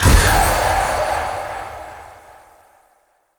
Rage Impact; Powers; Fey; Champion

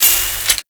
Flame Spear Ready; Warframe; Grineer

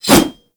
Tonfa Whoosh; Warframe; Tenno

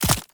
Oraxia Brood Spider Land; Warframe

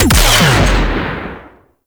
Shape Blast Shotgun 3d, Weapons; Warframe; Corpus

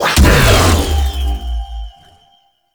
Stomp Impact; Warframe; Caliban

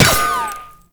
Shield Impact; Enemies; Gameplay; Lasrian Tank; Warframe

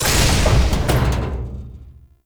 Portal Entrance Door Open; Octopede; Warframe; Duviri Murmur; Ambience

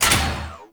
Ship Fighter Platform Stop; Warframe; Corpus; Ship Remaster

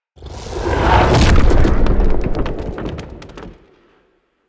Polymorph Tree On Pre Death; Enemy; Abilities; Characters; Mendicant; Mnd Wazard

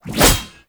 Bayonet Melee Combo; Warframe; Tenno

